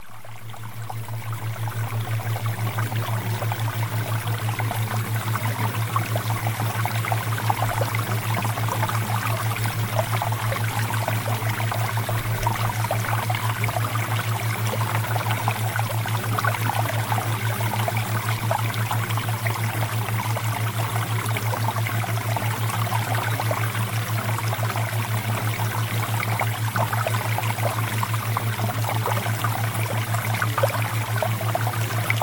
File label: Water Theta Waves